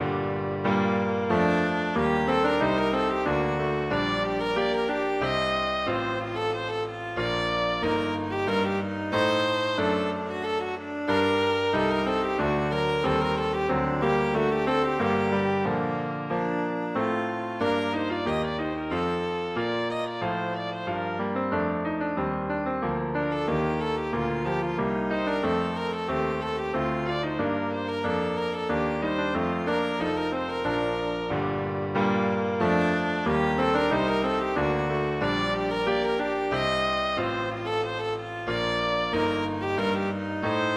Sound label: viola